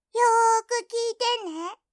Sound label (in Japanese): システム通知